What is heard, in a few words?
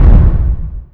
mancubus step; doom3